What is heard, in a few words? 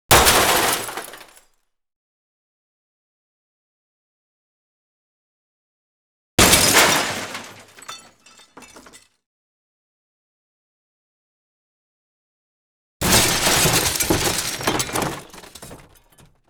impacts med glass; Asian Show Sound Effects